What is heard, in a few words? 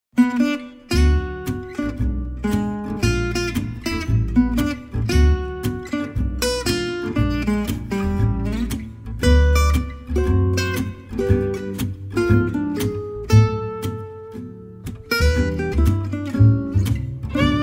Blues De Paris